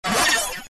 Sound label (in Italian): suoneria botton; beep